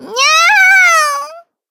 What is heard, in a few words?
nyan